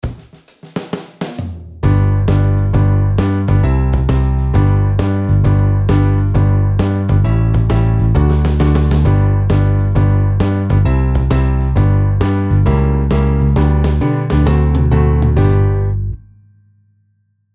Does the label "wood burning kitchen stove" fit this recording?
No